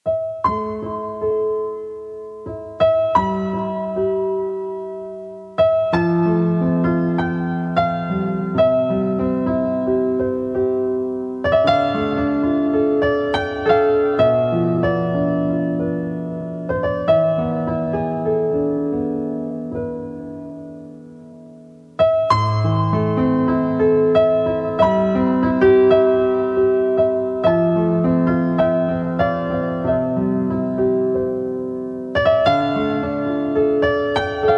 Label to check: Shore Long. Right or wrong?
Wrong